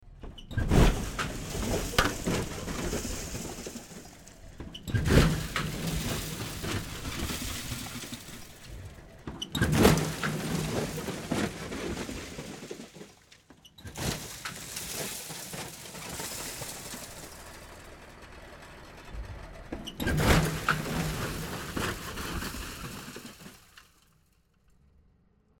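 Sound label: Junkyard destruction; mic perspectives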